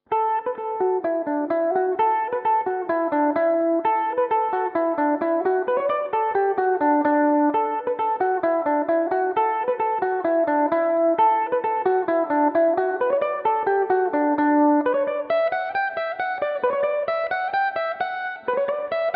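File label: polka D major belmuse, irish mandolin; red abbey polka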